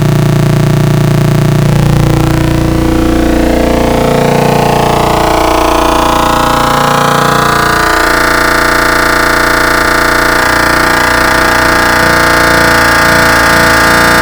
hexsquare with sine ifm ext sync varying pitch detuning; cyclebox; ext sync from afg